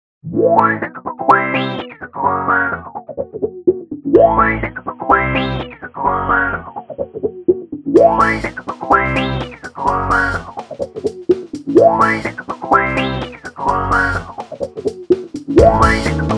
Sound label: Funky Music leader; Vet funky liedje voor een commercial